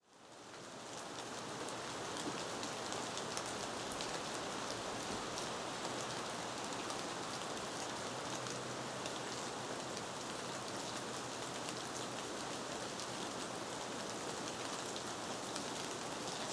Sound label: rainforest silent rain; Stille regn i regnskov, 30 minutter